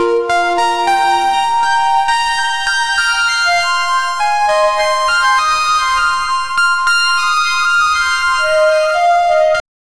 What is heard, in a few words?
an energetic four on the, loop